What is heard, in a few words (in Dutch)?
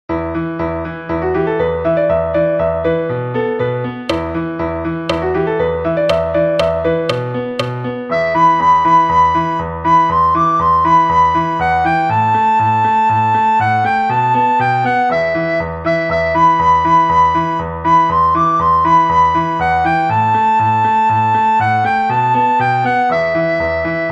sopraan; Flierefluiter 6